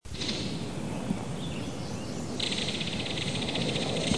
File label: Belted Kingfisher